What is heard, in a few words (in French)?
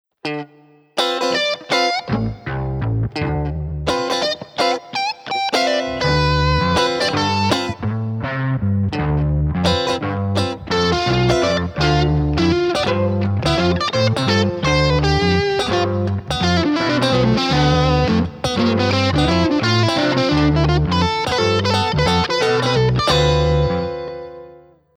Mode II Dorien